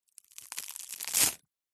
food cereal wheat biscuit snap break; zapsplat

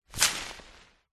foley tree leaves branch whoosh impact designed

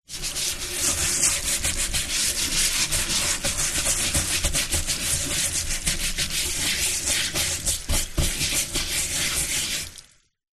Scrubbing Pan

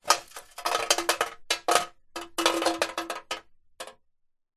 water drips sudden on metal tin; nature

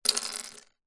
leisure lego pieces set down on wood table; zapsplat

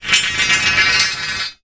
foley metal bar heavy long drag concrete; zapsplat

